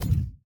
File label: Hand Paddle Attack Med